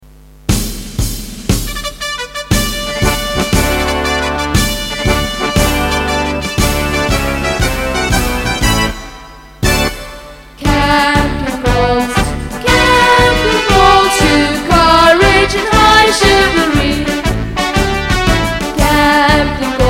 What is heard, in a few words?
School Anthem; Campion School